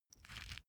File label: small pepper grinder pressured glass ring; industrie-wirtschaft; gastronomie